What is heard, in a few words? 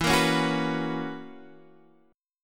B Major 7th Suspended 2nd Suspended 4th E